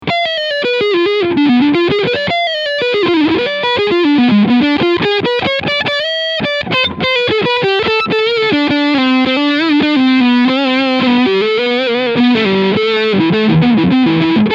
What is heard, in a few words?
Harley Benton SC 550 Leadsound Neck Pickup